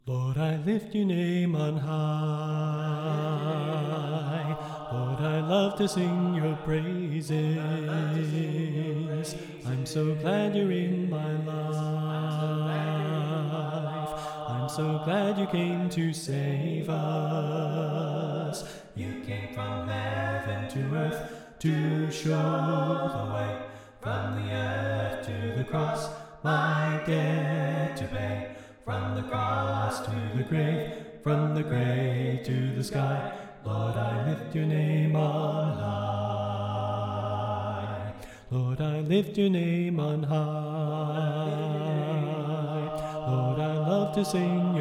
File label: Bass Left